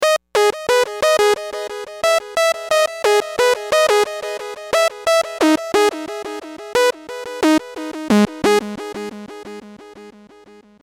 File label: main melody